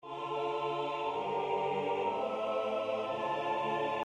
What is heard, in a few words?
choral